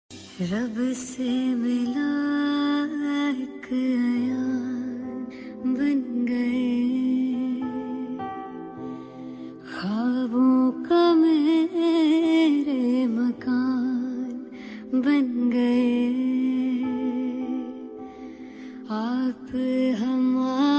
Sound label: female version anniversary song